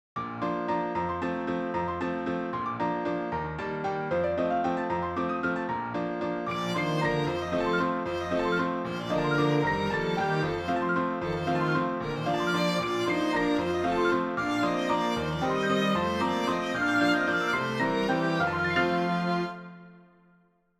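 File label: Nightingale to piano trio; Chamberlain; 1920; ensembles-2-or-more-instruments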